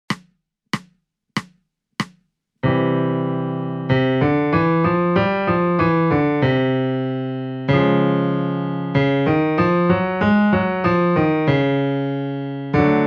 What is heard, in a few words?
major triad and 5 note scale 95 bpm C3